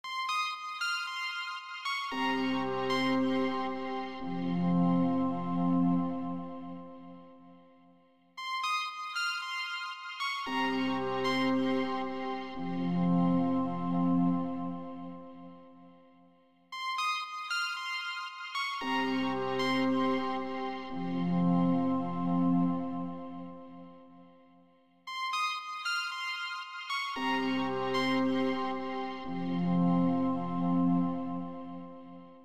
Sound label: Breathing Electric, musical beats; smirksmilegrin games